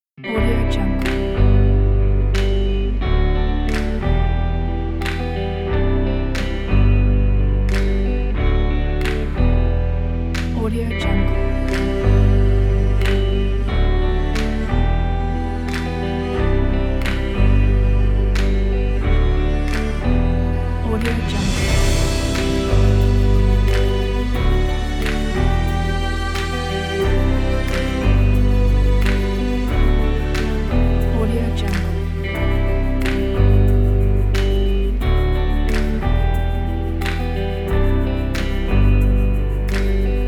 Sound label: calm motivational folk